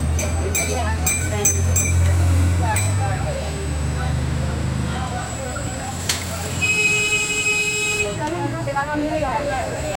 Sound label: indian tea shop ambience